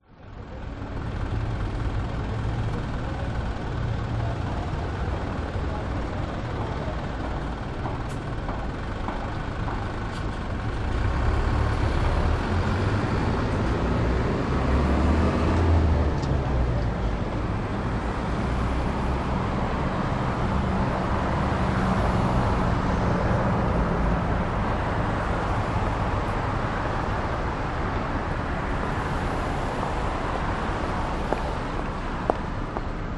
brisbane south traffic people corner melbourne street grey street